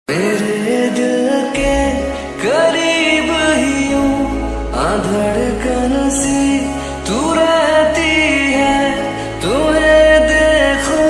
ringtone slowed reverb ringtone hindi song ringtone